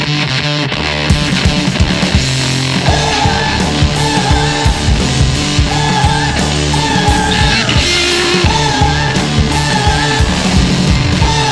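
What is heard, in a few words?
cool riff from rock and strings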